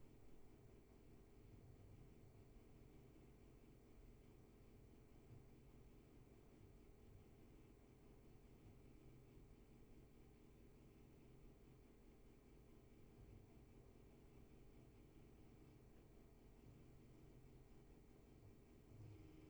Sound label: room tone